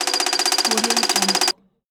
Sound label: time counter